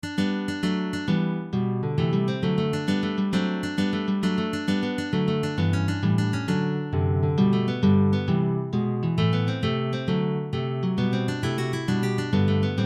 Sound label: Gigue; Holland